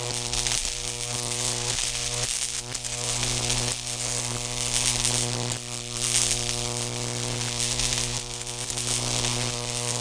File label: electric; Earth and Beyond; EA Games